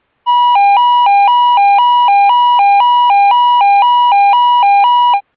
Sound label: Euro Police Alternating High and Low 969Hz and 800Hz